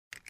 seatbelt click

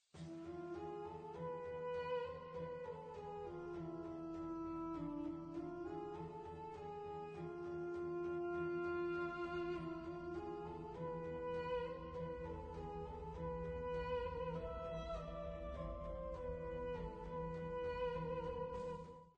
ancient Egyptian Music The Nile River

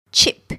chip

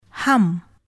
hum

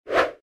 comedy swipe

